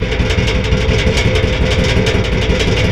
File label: MACHINES